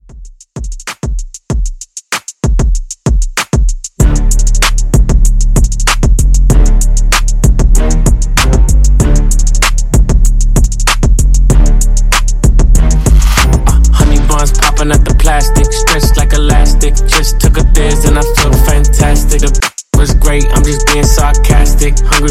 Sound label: Hip Hop Moombah; Redrum